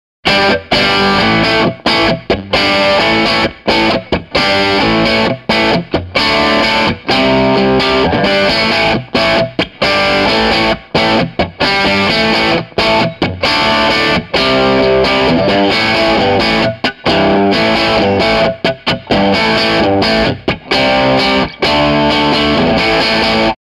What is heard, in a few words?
Crunch boosted